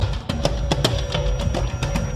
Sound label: Train; Slumdog